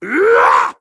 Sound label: horseman attack